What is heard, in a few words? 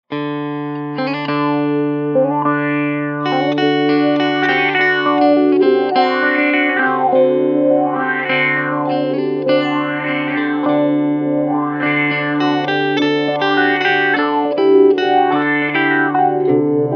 Sweeper